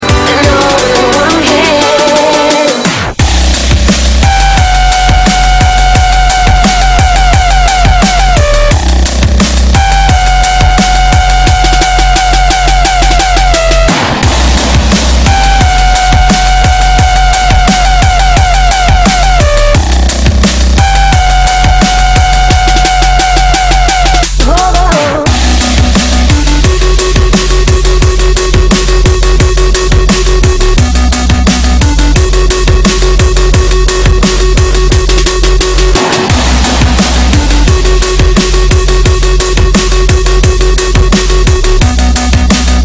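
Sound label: Ringtone Dubstep Minimal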